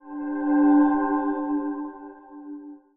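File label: Achievement Stylized Fx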